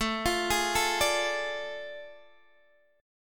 A Major 7th Suspended 4th Sharp 5th, Guitar, DADGAD